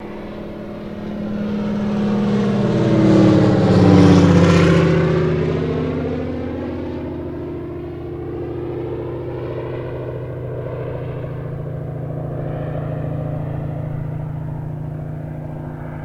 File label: Prop Plane DHC, de Havilland Canada, Single Engine By Medium With Long Tail